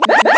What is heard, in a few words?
troopa koopa; mario kart wii